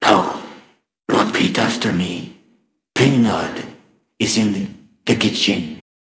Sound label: jingle